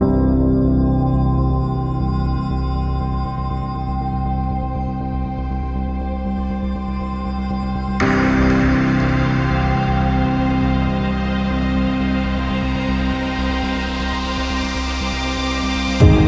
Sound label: Battle Future BGM; Neoverse